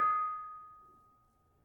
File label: Panerus 6 D Sharp, gamelan tuned; gravityharps